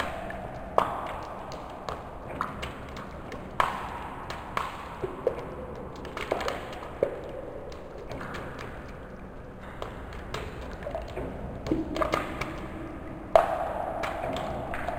Egout Goutte Loop Amb

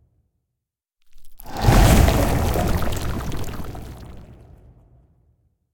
Coprs Tousse; Puddle Plus

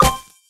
Caisse Eclat

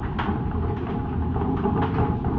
chariot rougae; Puddle

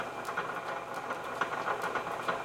Pilon Meca loop; Puddle Plus

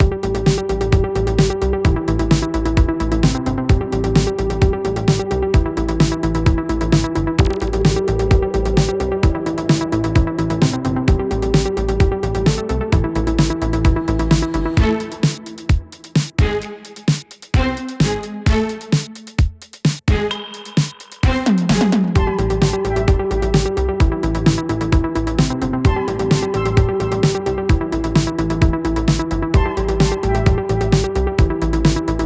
Train boucle pour; Firegirl: Hack 'n Splash Rescue DX

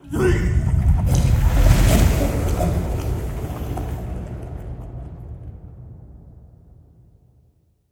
Coprs Vomi; Puddle

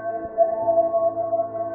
Loop jauge; Puddle; puddle plus ios gamerip 2018

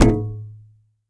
Hit Neige

